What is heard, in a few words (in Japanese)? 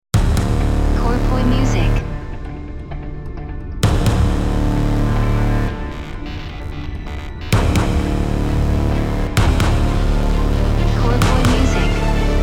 jingle ウォーター